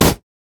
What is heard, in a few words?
red mech melee basic attack; minions